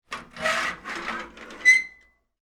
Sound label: Iron Board Fold, Household, Metal, Squeak; Laundry Sound Effects; BlastWave